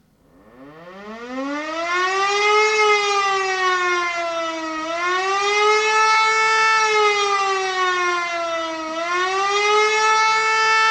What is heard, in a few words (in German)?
sirenenton warnung der bevölkerung